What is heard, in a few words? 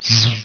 hmpf